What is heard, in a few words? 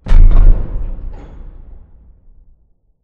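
explosion distant metal; corporate; Titanfall 2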